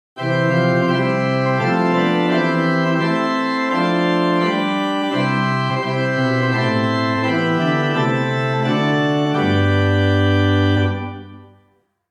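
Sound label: Open Diapason Large Principal 15th Mixture, organ; Great: Open Diapason Large 8', Principal 4', Fifteenth 2', Mixture IV; St. Anne; Hauptwerk; St Annes Moseley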